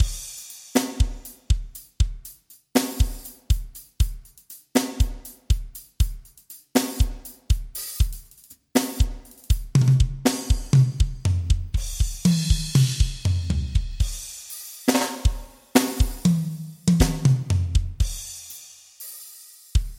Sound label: SD Arrocha